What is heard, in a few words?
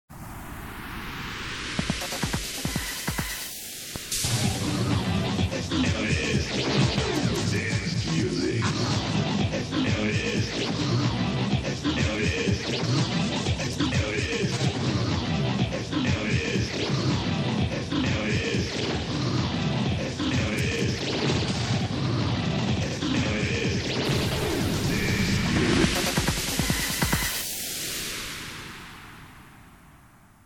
dance music long